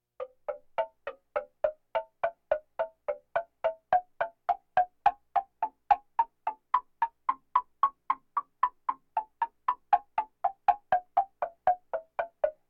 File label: Percussion Bongos Tympani rolls Gongs Drums